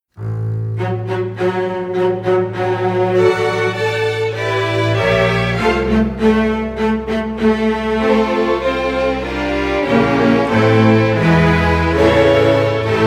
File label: jingle bells; pierpont; wingert jones music